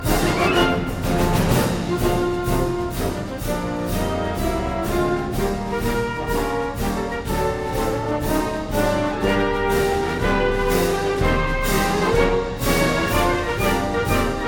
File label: Ringtone; USAF Band; USAF Song